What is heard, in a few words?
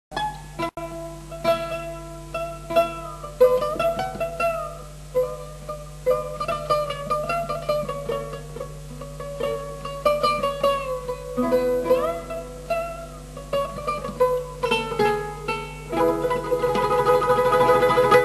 12 8 strings oud c